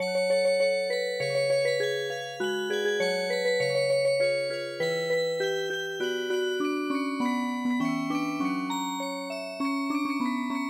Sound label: Music Box